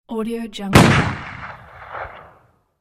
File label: loud shot